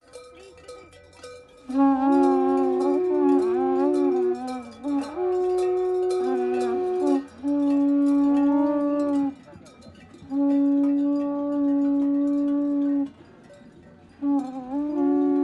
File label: bellmen traditional horns; fvtt sonniss gdc audio